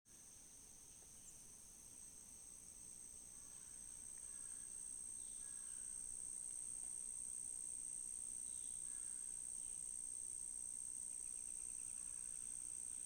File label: Crickets